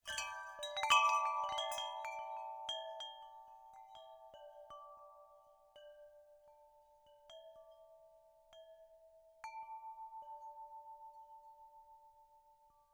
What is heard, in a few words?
windchimes, aluminum, pipes, wood clapper, aluminum windcatcher, metal chain, twine, release; gentle; Helina; Eiravaein Works